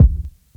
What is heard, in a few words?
verby bass drum single hit c sharp key